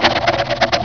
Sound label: scratch